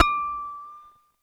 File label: ASI KOTO D5, Yamaha VP-1, ASIA-KOTO; Music Store; 90's Sample CDs